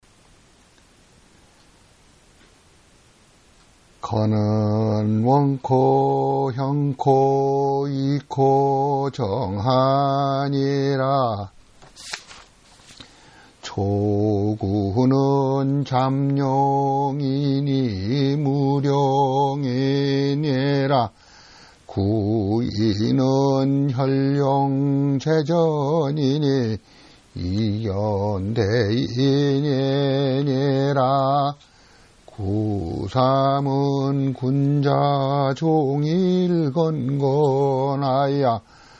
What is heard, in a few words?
iching chant